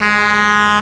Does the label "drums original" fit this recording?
No